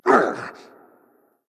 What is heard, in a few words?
hitreact human male small